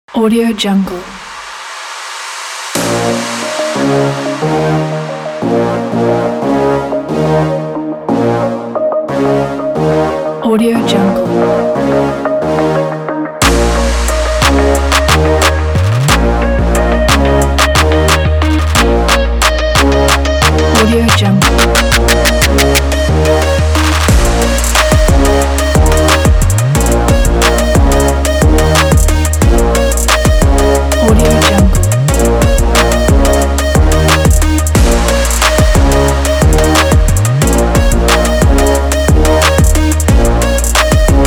energetic electronic sport trap

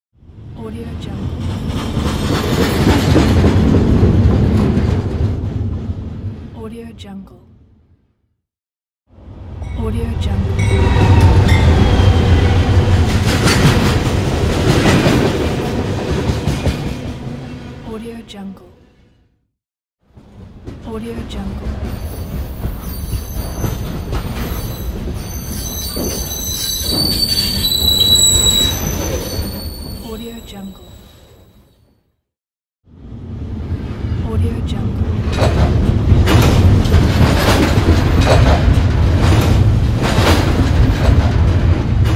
train sound pack

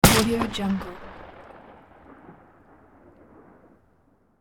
gun blast single outdoor long tail close

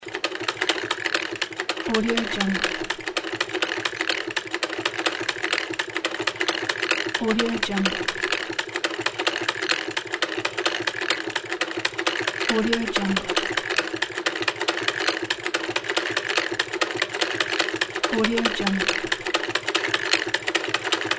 vintage sewing machine loop

